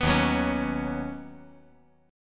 Eb Minor 7th Sharp 5th Eb

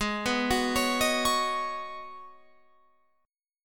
G sharp Minor 11th G sharp Guitar Open F, chord; G#m11 arpeggiated

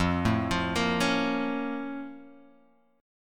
Bb Minor Major 7th

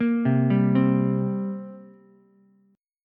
G Minor Double Flat 5th, down, Ukulele; arpeggiated; JGuitar

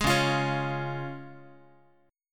Db Major Db Guitar; strummed

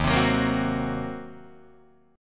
Eb Minor 6th Eb down Guitar DADGAD; strummed

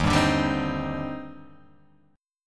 Eb Major 13th, EbM13 chord; strummed; JGuitar

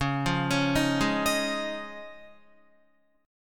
Db Major Add 9th, Guitar; arpeggiated; JGuitar

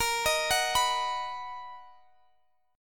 B Major, guitar chord; B/A#; arpeggiated; x,x,20,20,19,19

